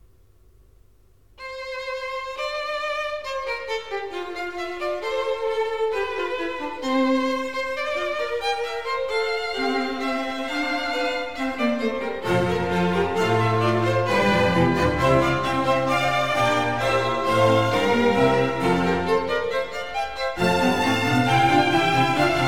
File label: Allegro, Conciertos Grossos; Concerto No.2 in F; Arcangelo Corelli; 12 Conciertos Grossos Op.6 (1966)